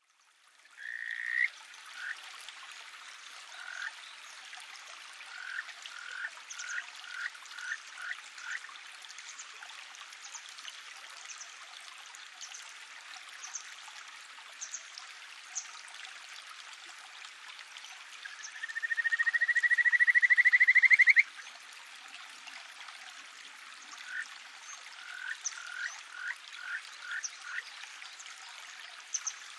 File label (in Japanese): kajikagaeru